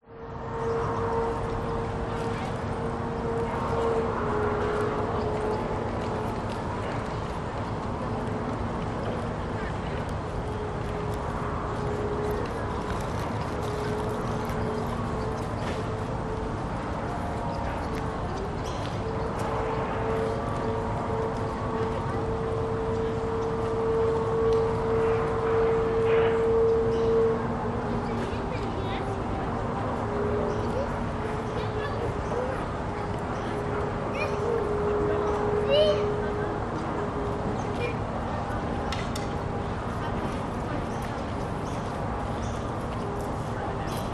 public places park botanic gardens cafe outside distant city few people birds; midday sydney